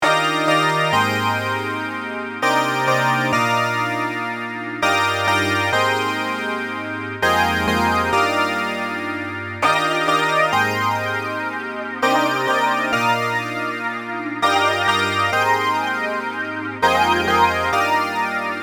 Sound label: 80ies Chord